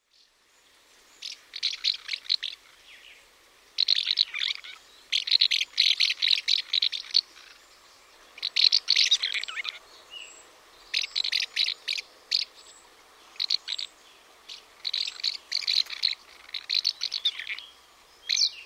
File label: house martin